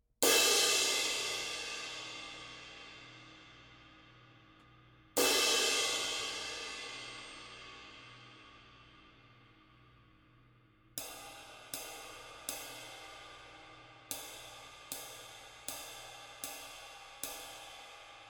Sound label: 22 k istanbul, K Zildjian 22